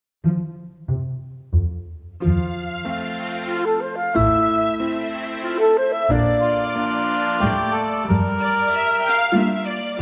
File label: Waltz; Puccini